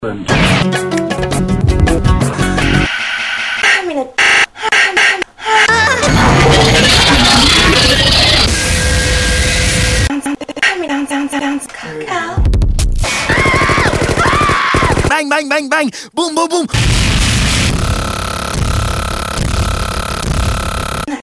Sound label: random noise